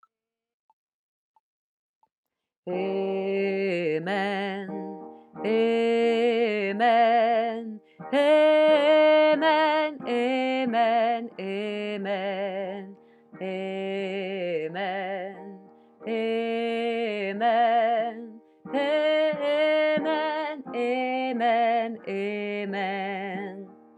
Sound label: Amen Let it shine - Tenor